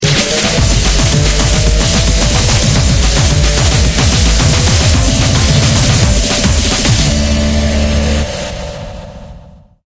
fail sound; loftus

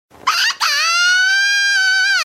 fail; freak fortress 2; sagiri

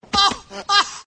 teleport; freak fortress 2; hentai woody

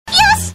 hit; freak fortress 2; sagiri

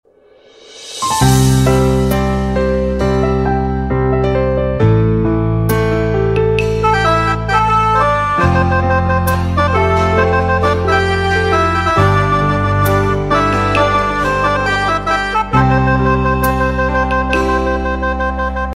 intromusic; ff2

